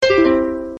UI AUTO STOP; Africa

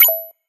level up; Wazdan

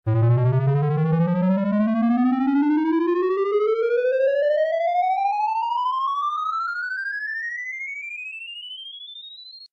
COLLECT GO; Helena

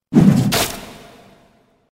hit; Lotus Lamp; slot game

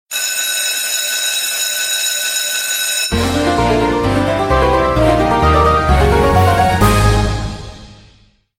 WIN MAX; Garden Of Riches

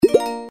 hit; Bubble Double

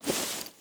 gamble sheet start; games; Corrida Romance; Wazdan

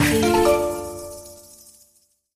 show all win; games; Aztec Gold Megaways

